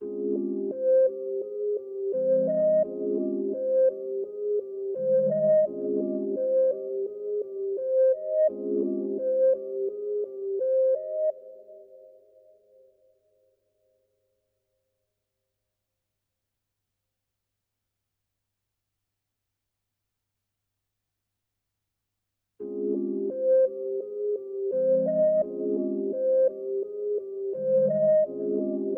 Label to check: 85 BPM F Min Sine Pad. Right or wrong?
Right